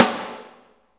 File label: SNR PICC, Snares; Roland - Rhythm Section